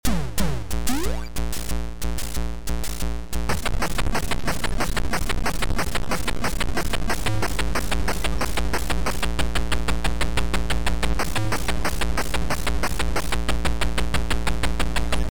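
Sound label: hardrock; KIR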